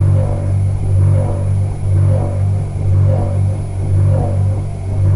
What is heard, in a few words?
Antique Fan Looped; fans